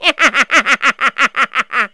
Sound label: LAUGH